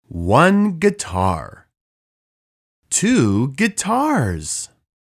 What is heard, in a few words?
Guitars